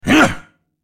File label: Pirate effort